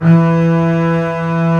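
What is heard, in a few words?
bass E3; Digital Sound Factory Virtuoso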